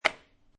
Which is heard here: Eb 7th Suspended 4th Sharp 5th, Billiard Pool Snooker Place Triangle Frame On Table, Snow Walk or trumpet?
Billiard Pool Snooker Place Triangle Frame On Table